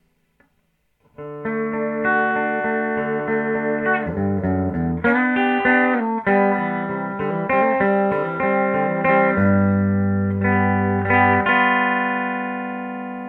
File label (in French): humb clean bridge, guitare; HUMBUCKERS GNB